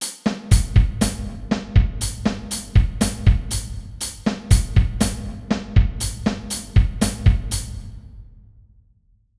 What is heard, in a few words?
60 bpm, batteur